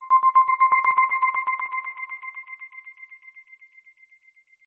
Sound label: Bells